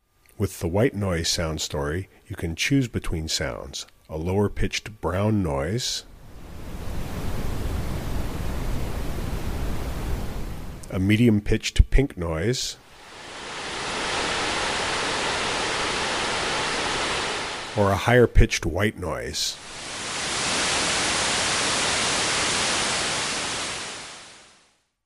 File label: white noise, Sound Sleep Machine; Ecotones Sound Sleep Machine ASM1002